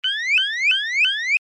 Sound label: alarm tone, sounder alarm tone; sounder beacon